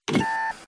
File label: warning